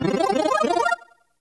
Modern Mario Powerup

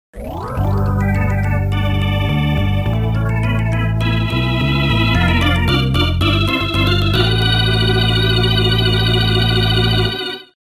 Music Race Intro Fanfare; MKDS; Stadium

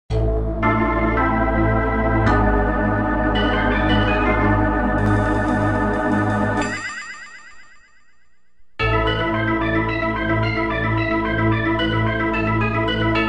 Tower Tennis theme music

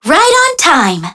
Alarm Clock

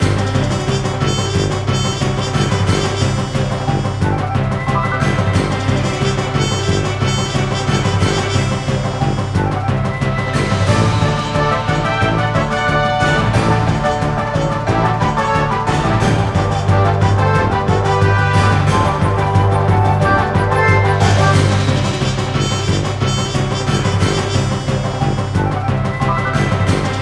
Attack and Run!

